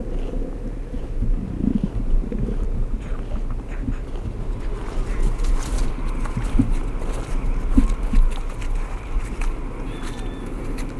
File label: King Penguin Footsteps